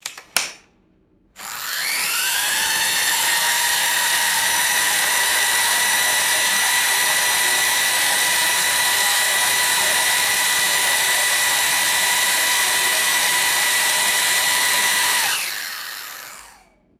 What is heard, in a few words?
electric hand mixer whirring and stopping